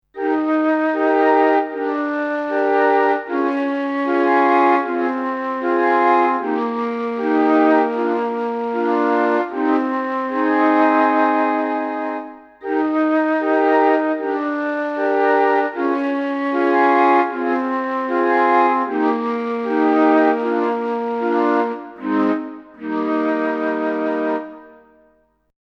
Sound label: Bass Flute Tape; dexibell